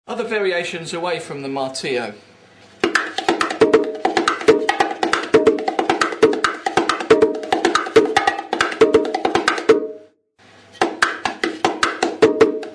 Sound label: MARTILLO STYLE, bongo